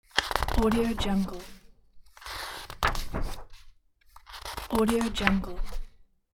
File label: pages